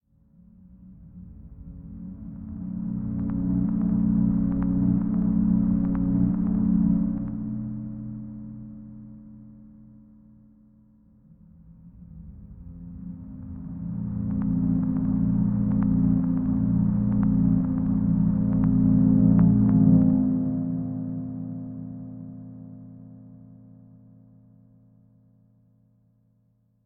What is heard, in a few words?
suspense; DayZ-Epoch; dayz sfx